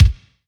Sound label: Soft Kicks; Vengeance Samples